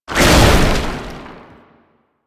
Tank Infested Charge Launch; Enemies; Abilities; Lasrian; Warframe

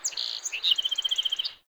wetland forest day bird close; Ambience

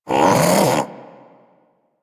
Armadillo Vocal Alerted; Characters; Warframe; Hunting Critters

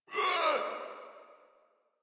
Infested Runner Pain; Warframe; Runners

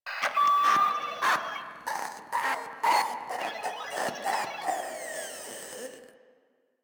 Proto Infested idle; Warframe; InfestedNinetyNine

